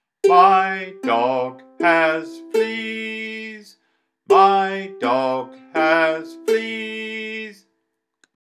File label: My Dog Has Fleas Sung and Played